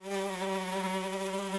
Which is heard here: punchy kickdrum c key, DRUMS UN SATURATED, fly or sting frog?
fly